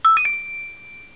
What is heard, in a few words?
key pickup; guant